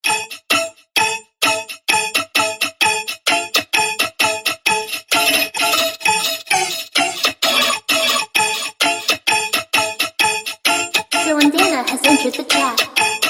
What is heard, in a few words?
INSTRUMENTAL